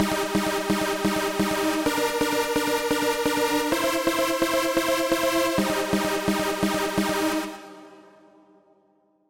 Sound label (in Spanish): Lead procesado